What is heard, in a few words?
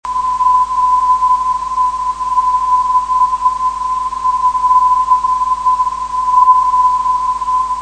483khz